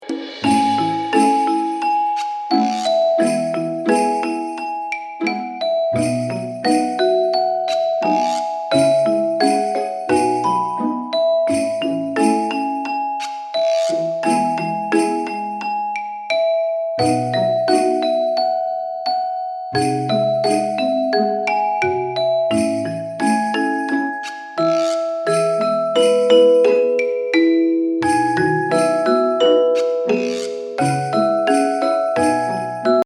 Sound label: Lullaby